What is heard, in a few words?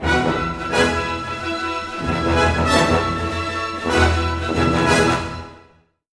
HORSE, Western; East West; Scoring Tools; ORC